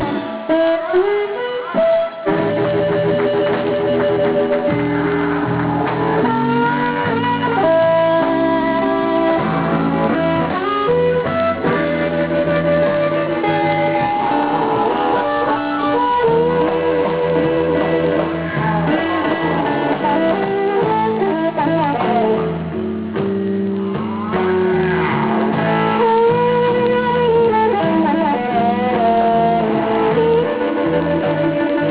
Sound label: slow blues in e, harp